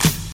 hip-hop clap sample d sharp key, Royality free hand clap sound tuned to the D# note